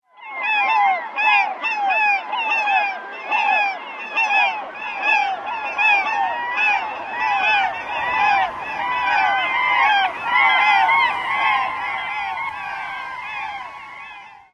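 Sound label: calls of flock, Slaty-backed Gull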